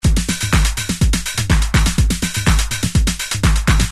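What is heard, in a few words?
old house break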